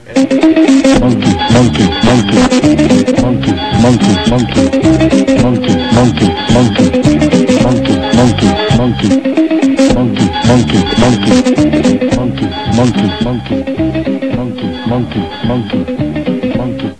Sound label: monkey song